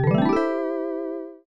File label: game end; wizard